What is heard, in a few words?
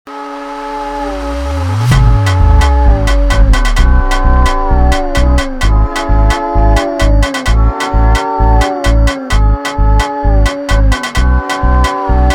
Meow Scary Funk instrumental Version